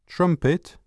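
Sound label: trumpet; CD Action 18b 1997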